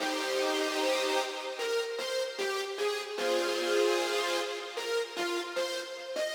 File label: synth